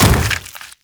Ancient Tank Step Default; Infested; Emissary Ancient Tank

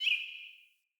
Bird Single Chirp; Josalfar Bird

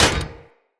Short Sword Medium Impact Dmg Metal; Weapon Impacts; Player; Swords; Combat

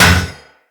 Disrupter Aura Disabled; Corpus Modular Crewman

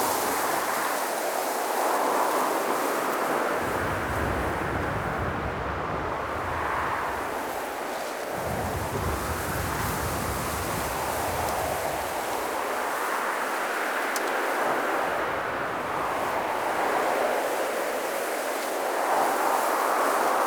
Exterior Background Amb Loop, ambience; Duviri

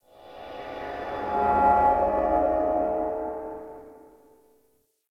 Interior Cave Tonal Drones; Misc Indoor

